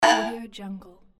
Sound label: jump